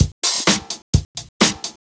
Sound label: Housebeats 128BPM; Vengeance Samples